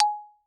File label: Xylophone rosewood ff Ab5 stereo; MIS Pitches - 2014; Percussion